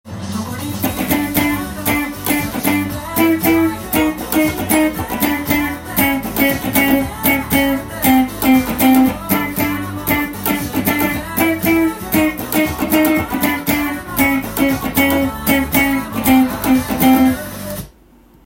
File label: jaze kirari guitar